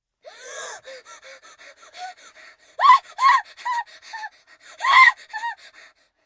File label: Panting Panic, Gasp, Breath, 1 child, scared heavy breathing, human voice, kid; Gasping; Voice Box